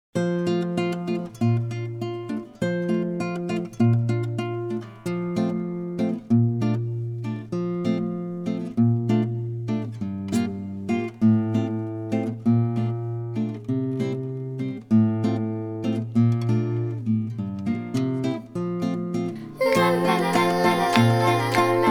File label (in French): accompagnement seul